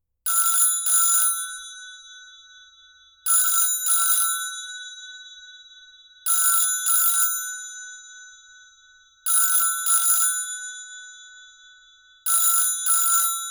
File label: analogue rotary dial telephone ring uk 25hz; RedSonic